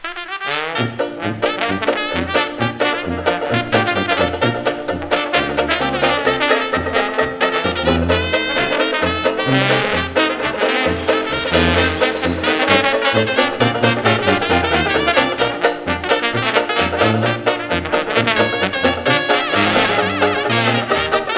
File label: jazz